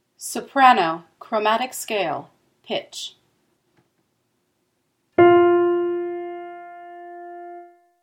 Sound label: soprano chromatic; all-state choir